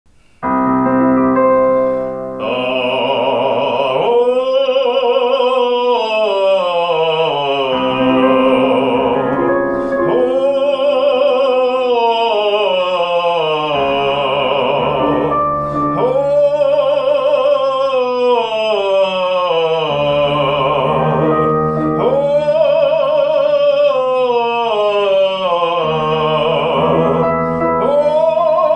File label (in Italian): baritono